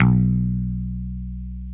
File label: DAN MUTE B2, Bass